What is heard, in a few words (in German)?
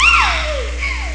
stoehn; ST-XX Amiga Soundtracker Sample Packs